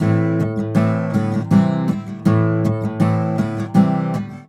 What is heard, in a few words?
AM GTR, Celtic Flavours; USB Soundscan vol.42; AKAI